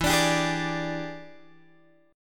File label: E 7th Sharp 9th Guitar Open G, chord